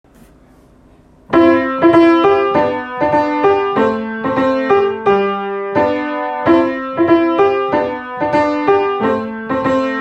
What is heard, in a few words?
fanfare